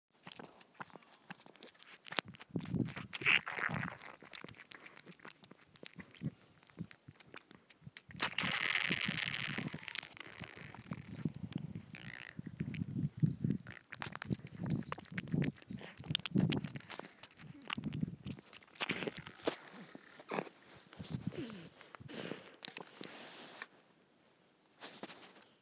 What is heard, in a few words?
bottle feeding a lamb